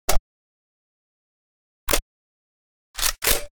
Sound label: psg1 reload; STALZONE